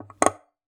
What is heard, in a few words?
Classical Guitar Extended Technique